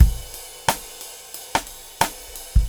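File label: 89 bpm, beat loops; Zero-G; Monster Beats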